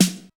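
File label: SD SD 808 H, snare drum; Sound & Vision - Gigapack I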